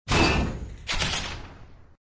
damage, monster

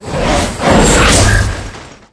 mech buffalo attack, monster

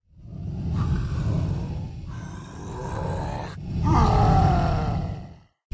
vampire idle, monster

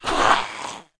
gnollmage damage, monster

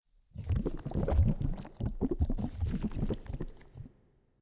entrance, undeadtower

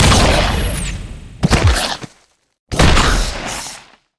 Boss Mech Turtle deth

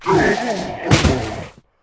dark knight dead, monster

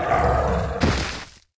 dead, monster

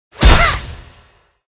night officer attack, monster

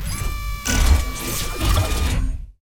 cannon idle, monster

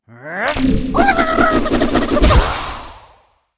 mob real bear attack, monster

